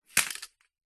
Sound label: leisure toy cars x2 drive crash into each other; hotwheels